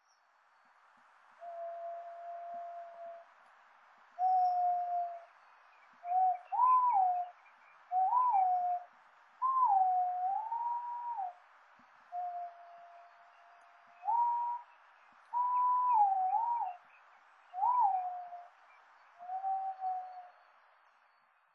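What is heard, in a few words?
aobato, White-bellied Green Pigeon; shiiba; koe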